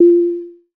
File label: bong